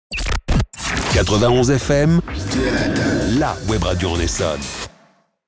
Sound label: Sweep ID; 91 FM; Essonne